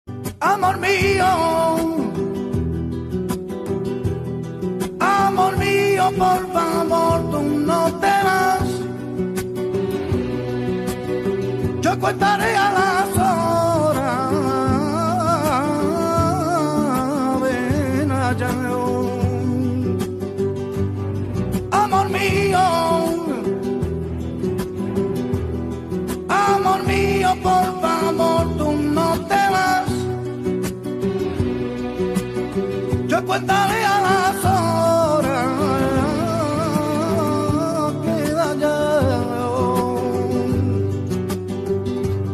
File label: flamenco